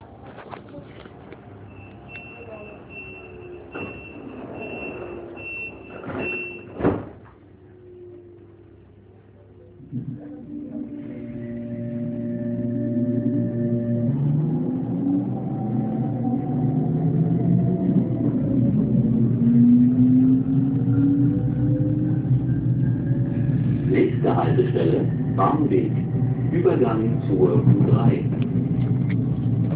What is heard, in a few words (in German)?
474 Anfahrt; Bahn